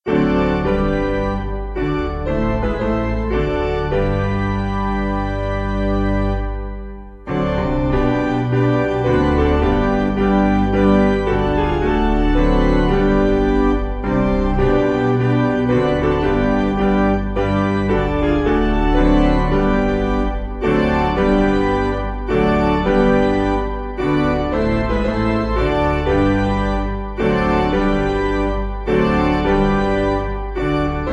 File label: O Piano